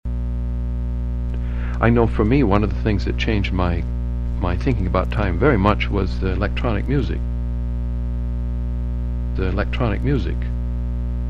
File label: Hum; RX2 Test